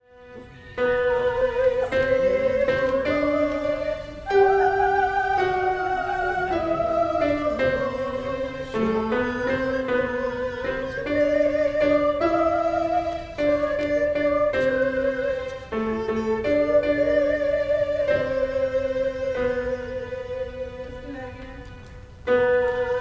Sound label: soprano